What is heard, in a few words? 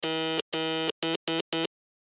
Morse Code 2 Number; Blastwave; Technology